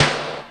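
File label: reverse snare drum; Crypton - DrumBase5000; GATE KITS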